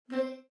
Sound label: Mistake